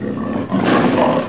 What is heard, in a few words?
LION, animal; 动物